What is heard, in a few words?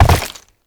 land rock; Movement; Warframe; Uriel